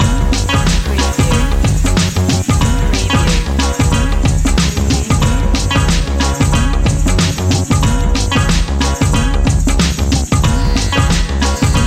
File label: groove loop